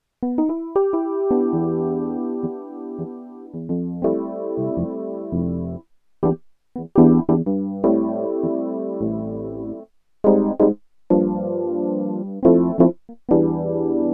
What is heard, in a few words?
Alesis Recital Pro E Piano